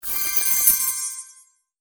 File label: receive